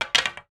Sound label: Death Bow; Shadow Minion3